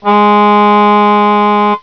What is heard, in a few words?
tone